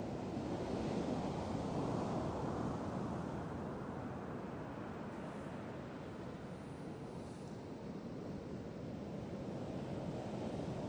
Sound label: room, drums; virtual drum sounds; custom drums